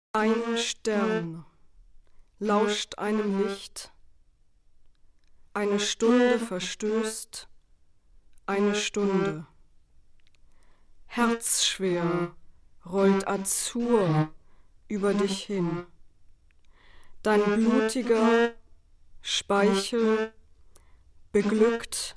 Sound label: flute; Celan